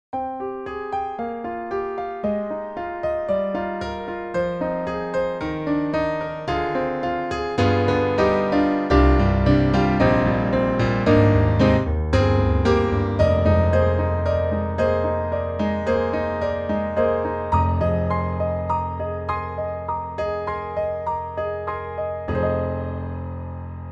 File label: Roland INTEGRA-7 Full Grand 2 (SuperNATURAL Acoustic Tone)